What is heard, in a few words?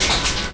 Midticks